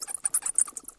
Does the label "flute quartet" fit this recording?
No